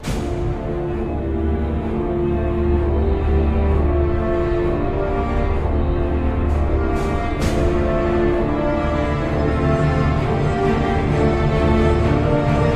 Ending Sequence; Unreal Tournament beta

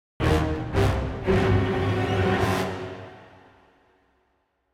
Sinister Stinger

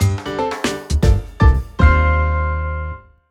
Jingle Trump Opening; Clubhouse Games: 51 Worldwide Classics

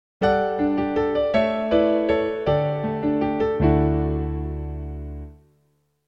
JINGLE Inn; Monster Girls and the Mysterious Adventure 2; windows

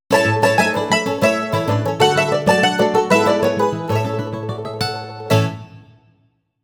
Victory!; Magic Pengel

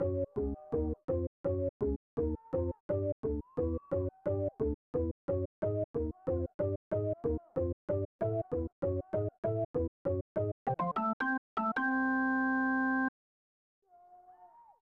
Organ Music; Troy Aikman NFL Football; SNES

